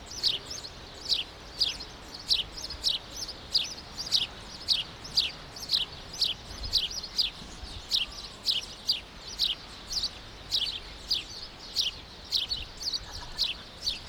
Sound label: Passer domesticus; House sparrow; A series of chirps